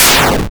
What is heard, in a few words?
explosion; roomforchange